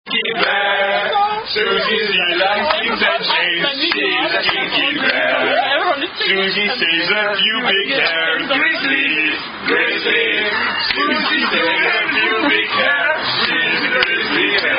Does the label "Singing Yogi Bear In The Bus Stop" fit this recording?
Yes